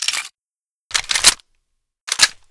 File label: thompson reload; weapons